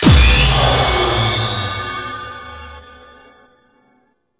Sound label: Enemy Spawn; Warframe; Duviri; Dax